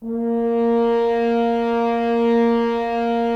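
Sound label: Solo French Horn, Brass; Roland - LA Composer vol. 4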